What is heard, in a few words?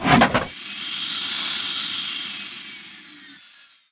pickup jetpack